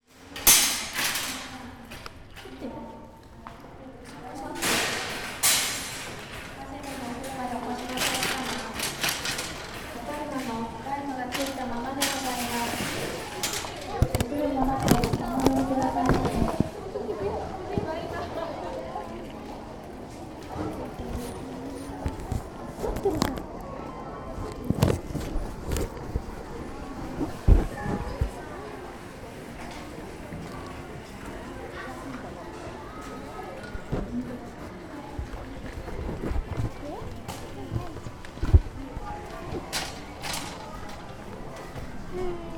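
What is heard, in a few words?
shopping cart; Chino Cultural Complex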